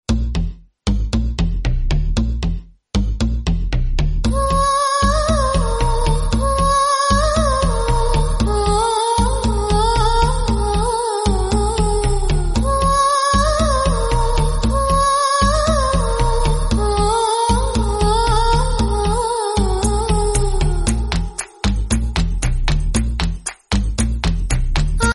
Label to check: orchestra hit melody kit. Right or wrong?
Wrong